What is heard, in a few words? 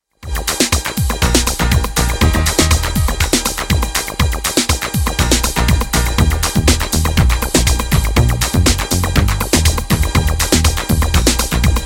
orbital chime